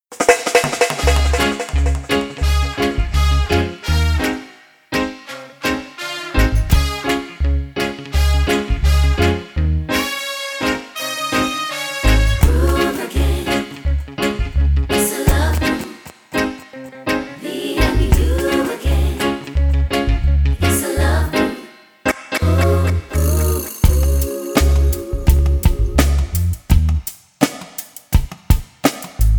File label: Groove DUB